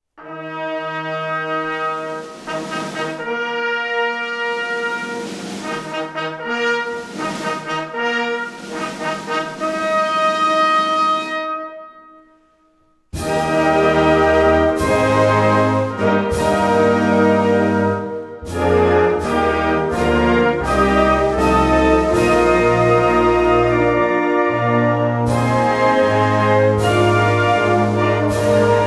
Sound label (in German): Festmusik